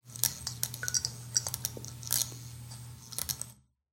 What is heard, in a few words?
light flicker, ambient lights